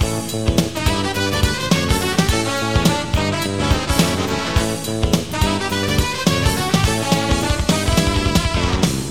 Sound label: jazzy